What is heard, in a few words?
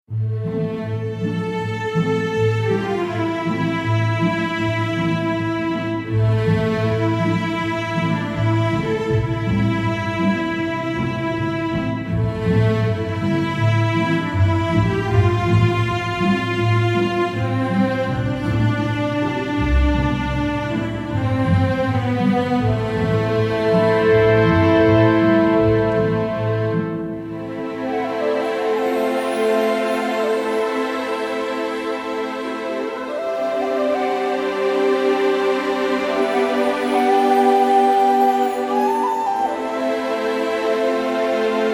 magical forests 160bpm; soundcritters; colonization